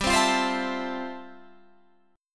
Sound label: F Major 7th G; Fmaj7/G strummed; JGuitar